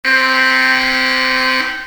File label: alarm-clock